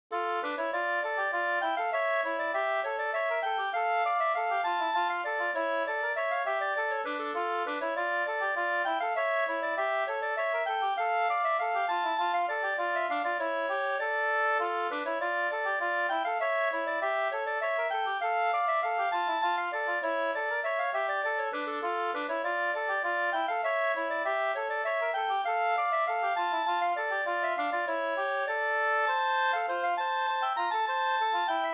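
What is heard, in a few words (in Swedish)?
polska, tvåstämmig; Lohtea Karleby fi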